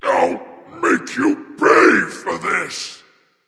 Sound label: death; smite; thor